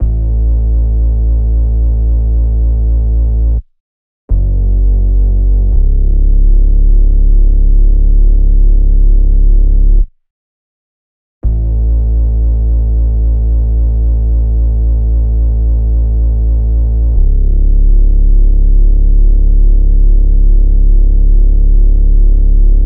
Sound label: Synth Bass C#m 84, Lo-Fi; Melody Kits; Loops; Ghosthack; HipHop; Glow